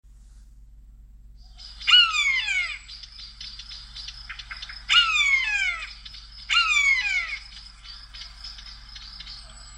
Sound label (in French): Cri de buse